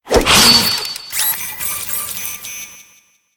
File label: death; awesomenauts